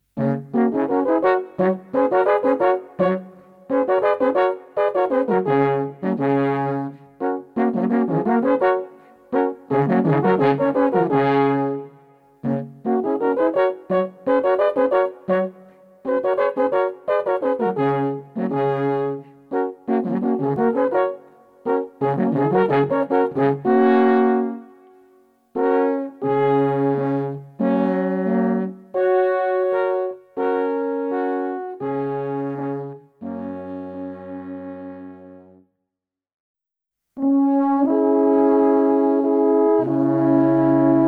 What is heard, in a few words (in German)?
alphorn trilogie